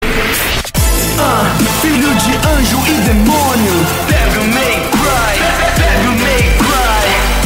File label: rap; devil may cry; rapgame